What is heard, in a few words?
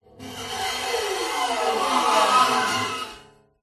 musical guitar steel string knife scrape strings weird abstract scraping tone